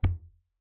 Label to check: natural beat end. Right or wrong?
Wrong